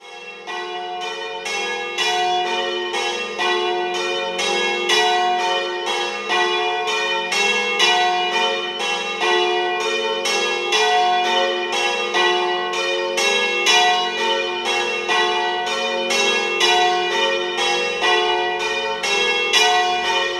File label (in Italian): Puja, campane